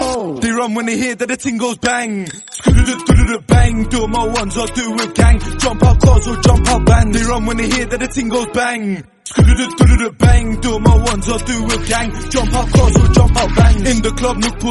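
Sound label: violin; london view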